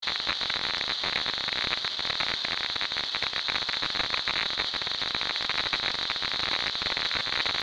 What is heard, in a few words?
Radio static noise loopable